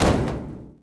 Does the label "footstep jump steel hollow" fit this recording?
Yes